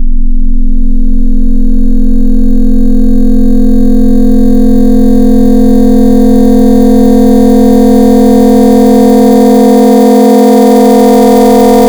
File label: FM Wavetables, Bell Harmonics; Cymatics; FM Wavetables Vol 4; Serum